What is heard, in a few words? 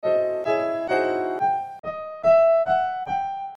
real source fade